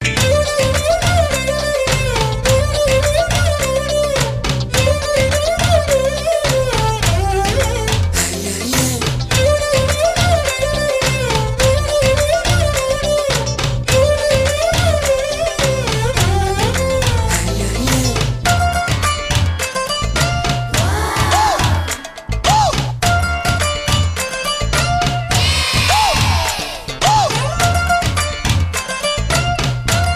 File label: Music Bit Telugu Ringtone